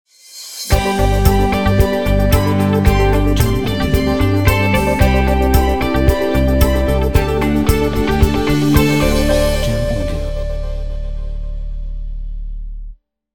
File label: Corporate Intro